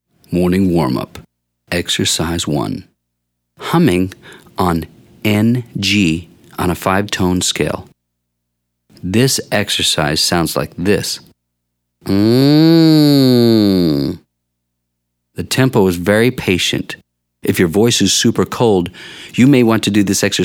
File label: Warm Up Humming on NG; Ex 1